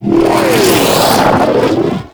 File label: Capital Ship Missile Whizzby; Warframe; Corpus